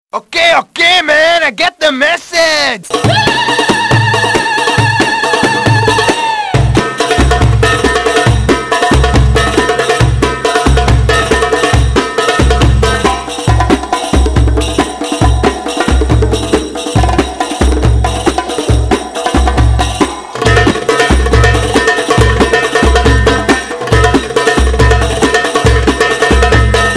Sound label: funny arab ringtone